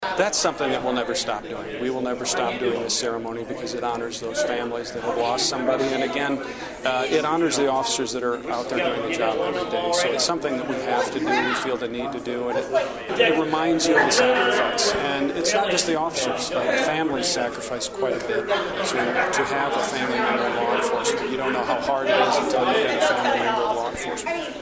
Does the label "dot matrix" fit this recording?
No